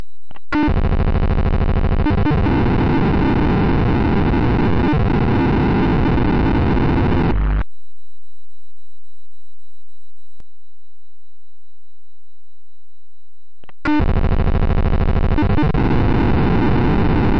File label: golay paging